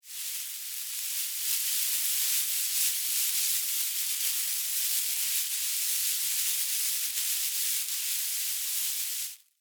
Snake Slither, Animal, Snake, Slither, Grass, Movement; BlastWave